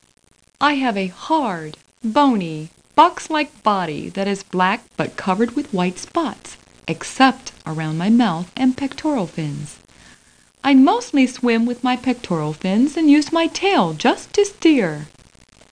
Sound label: Sound trunk